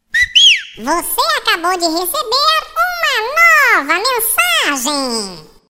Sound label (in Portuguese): msg texto